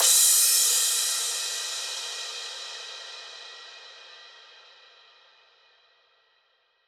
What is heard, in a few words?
Crash